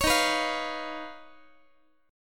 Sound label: D sharp 7th Suspended 2nd Sharp 5th, Guitar; strummed; JGuitar